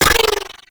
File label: Sniper Rifle Layer; Wraith; Grineer; Female; Weapons